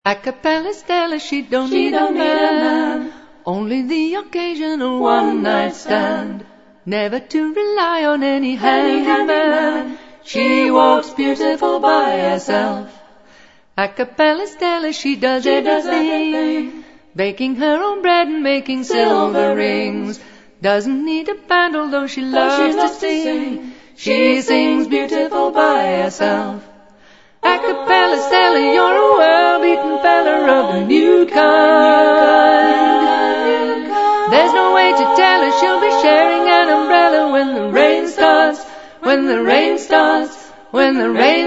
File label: Acapella